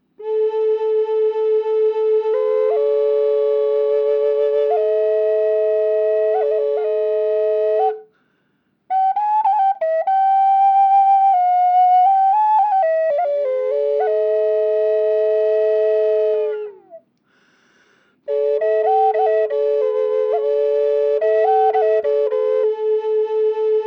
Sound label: drone lakota in key of la high, flute